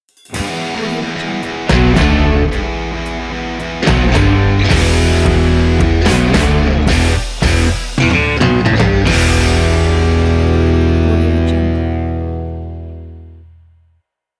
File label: rock intro